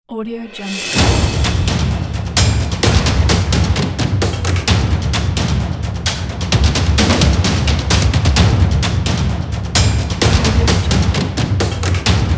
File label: epic drums